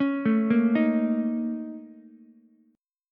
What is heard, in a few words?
A sharp Minor Major 7th Double Flat 5th, A sharp, down, Ukulele; A#mM7bb5 arpeggiated; JGuitar